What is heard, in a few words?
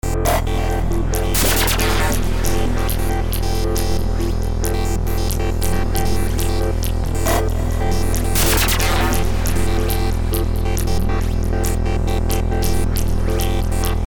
game music vs dark ambient